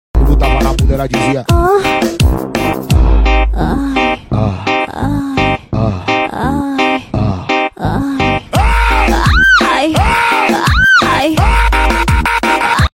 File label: moaning sound effect song; vikram moaning bgm